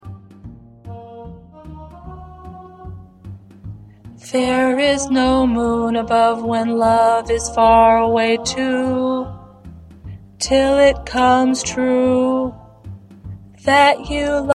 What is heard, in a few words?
Alto; jazz tunes